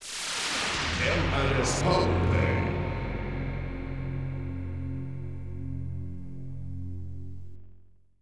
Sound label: Sweep stereo echo; madeinbrazil